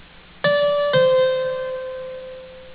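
Accent fallend (descending appogiatura; Palmer: "appogiatura from above")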